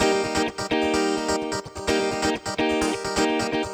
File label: Electric Guitar - D# maj, 128BPM; Vengeance Samples; Electric Guitar Kit 1